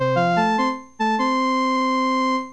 Charge

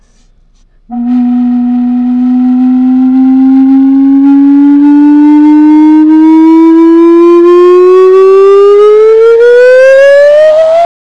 Up Whistle

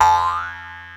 Boing

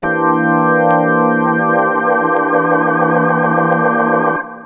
organ chord

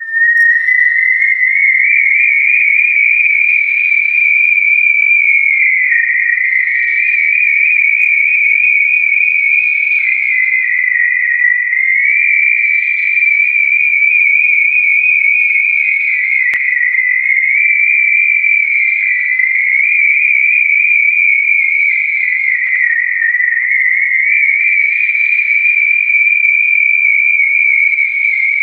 whistle

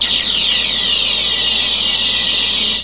phaser

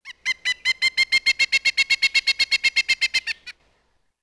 Bird Jungle

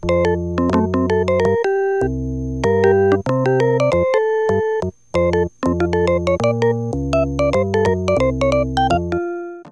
Theme

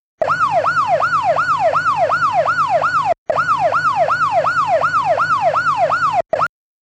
Siren

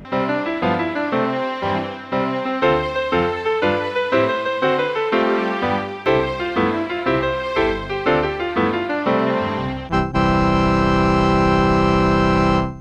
Ta Da; yellowbrick

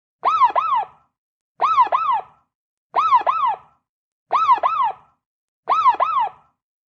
Siren

